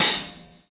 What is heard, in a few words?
Hi Hat; Sonic Foundry (Sony Creative Software); Drums & Drones; Construction Kits; Pull Kit